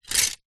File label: household clothes hangers plastic bunch several set down; zapsplat